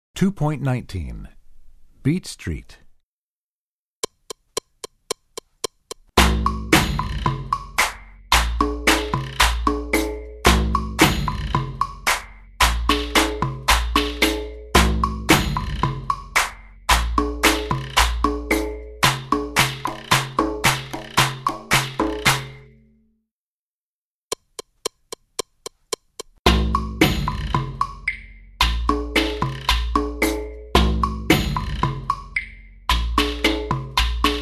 Beat Street, Percussion; Percussion Book 1

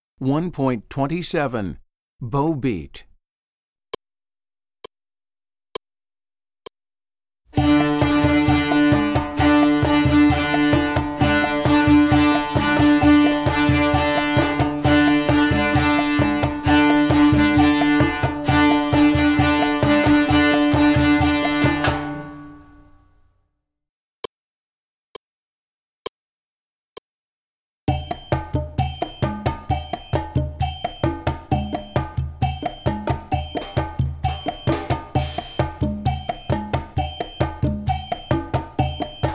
Bow Beat

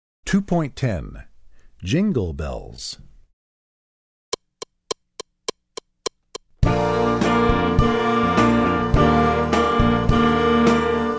Jingle Bells

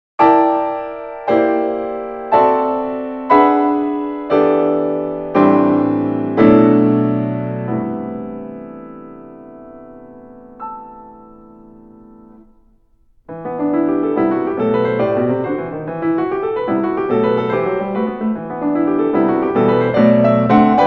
Jazz Toccata

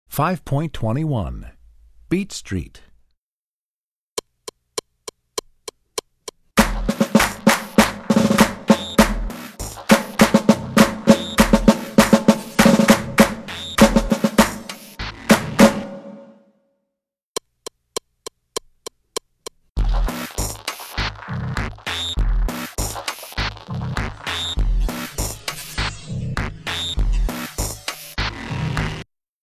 Beat Street, Percussion; Percussion Book 1

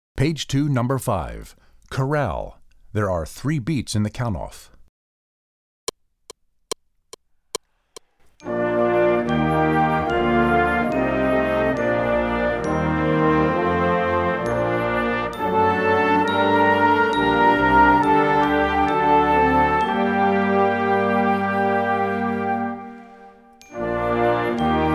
Chorale

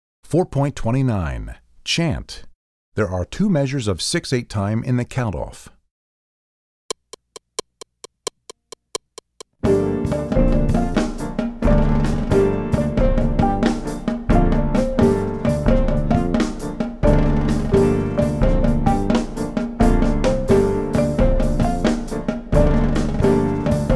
Chant, Percussion; Percussion Book 2

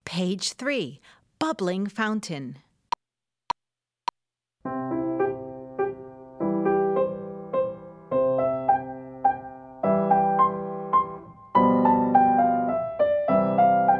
Bubbling Fountain Practice

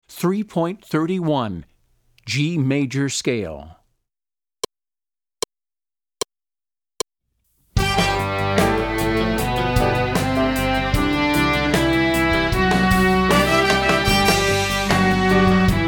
G Major Scale

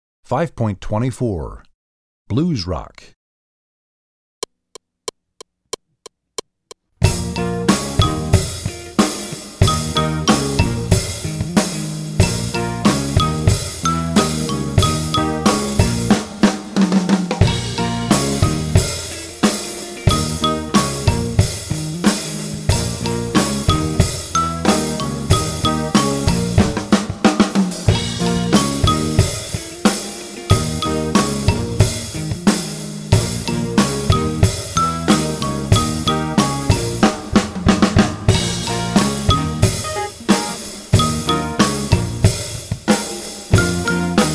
Blues Rock, Percussion

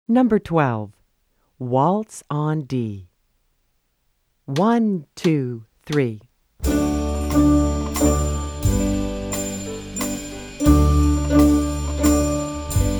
Waltz On D

